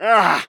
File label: Grunt Hit; Knight; paladins